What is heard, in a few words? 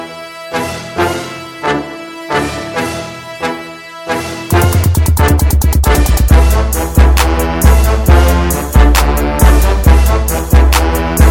Hip Hop Gangsta